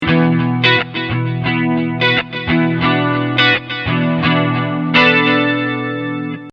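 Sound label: Chorus Delay, Strat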